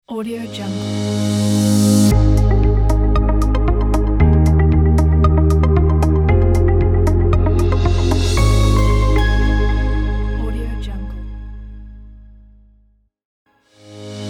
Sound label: inspiring ambient warm logo